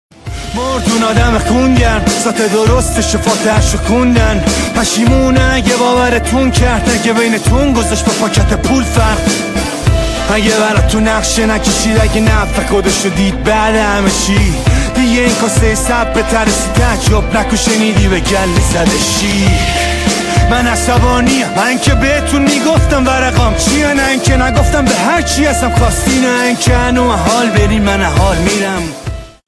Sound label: Remix